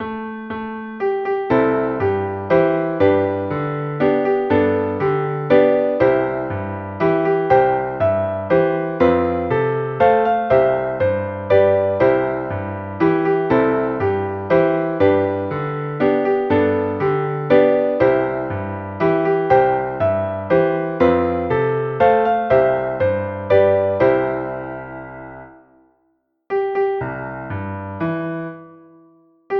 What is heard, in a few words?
Piano Playalong